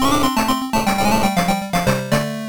Stage Clear; Battletoads; Game Gear

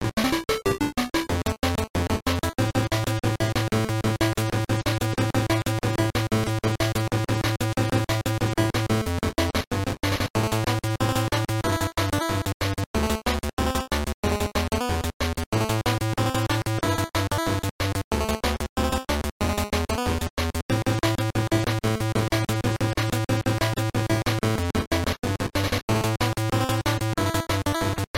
Stage; Battletoads & Double Dragon

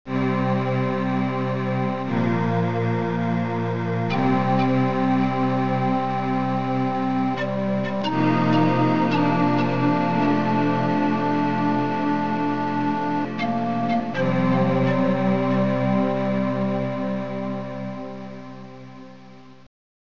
Game Over; DKC

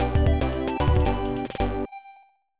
Stage Clear; Diddy's Kong Quest; Disco Train

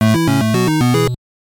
Level Complete; Cobra Triangle

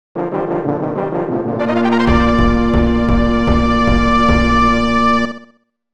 Opening Fanfare; DKC

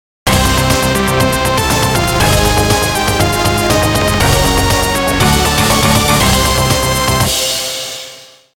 fanfare moon

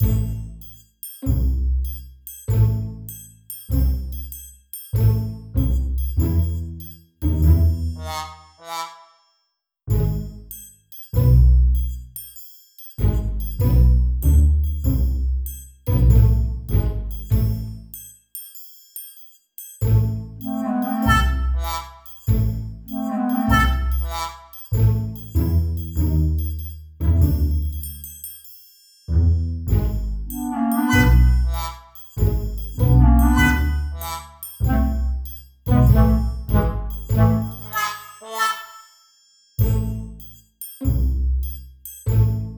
Windy Lp

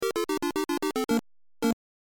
Snake Rattle Game Over

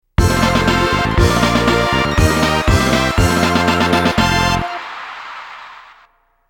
Battle Victory

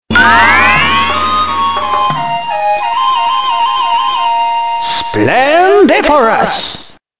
Amulet Piece Collect; Sabre Wulf

